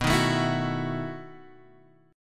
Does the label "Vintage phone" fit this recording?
No